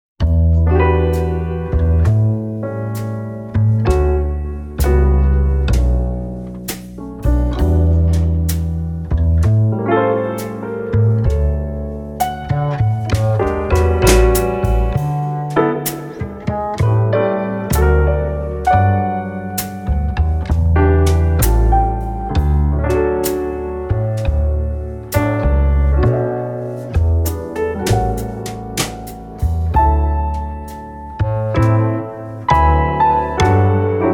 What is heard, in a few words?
Older Style Jazz Ballad; chapter 10 the ii v i progression